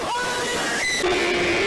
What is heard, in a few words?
hehe; fnati